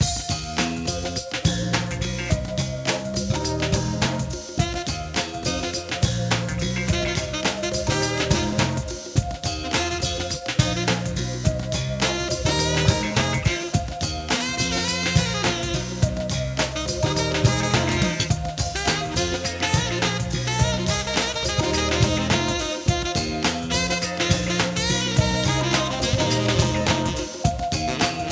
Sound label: SAX